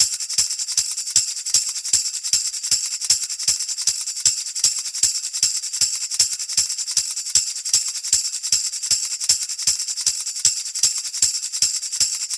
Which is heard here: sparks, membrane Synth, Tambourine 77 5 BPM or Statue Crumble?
Tambourine 77 5 BPM